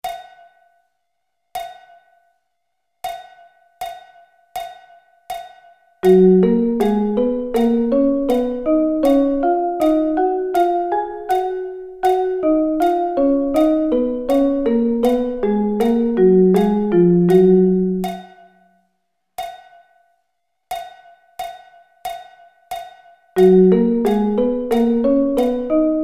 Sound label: Thirds